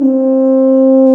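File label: brass, TUBA C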